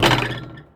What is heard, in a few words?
Street Ball Glass Rim; SFX Kit; Street Basketball